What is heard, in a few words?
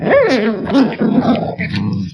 earthshock; ursa; ti10